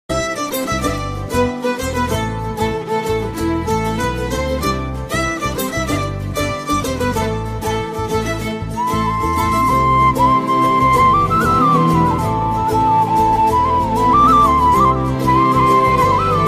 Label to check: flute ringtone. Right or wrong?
Right